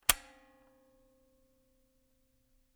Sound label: Camera, Kodak EK2 Instant, polaroid, large, plastic, springs, snap; Eiravaein Works; Vaeyan IV